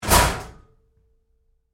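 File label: Door Locker Close Roomy Medium, Metal Door; Door,Locker,PK1; Metal Doors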